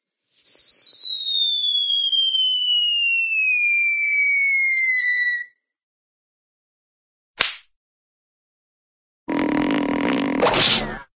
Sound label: whine, cartoon shell screaming whine down